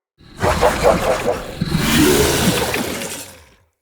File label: Summon, Vocals; Characters; Enemy; Corrupted Sproutfolk